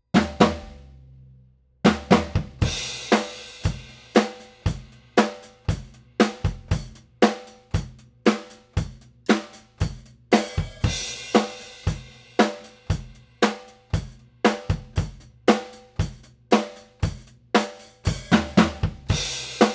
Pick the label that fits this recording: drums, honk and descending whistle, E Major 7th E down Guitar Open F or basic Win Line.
drums